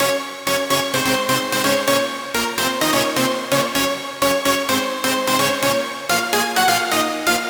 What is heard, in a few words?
Super Saw Loop, 128 BPM F# min; House; Synths